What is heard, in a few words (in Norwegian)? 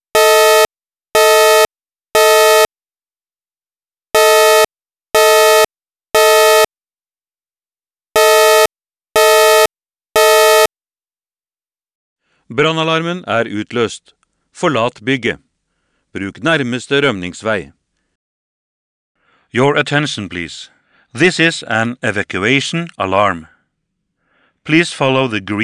3x alarm tone, Evak uten heis, NO ENG; talevarsling